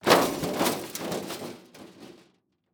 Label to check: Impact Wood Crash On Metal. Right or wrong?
Right